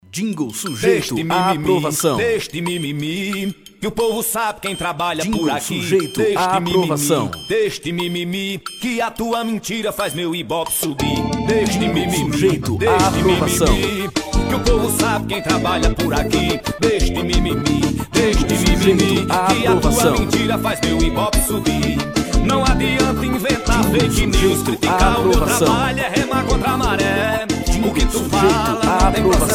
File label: Deixe de mimimi Jingle